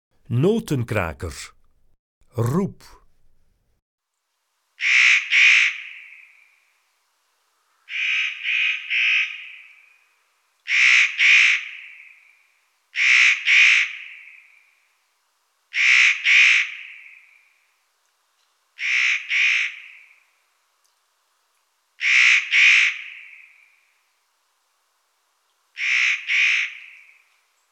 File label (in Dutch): Notenkraker, Zangvogels; IVN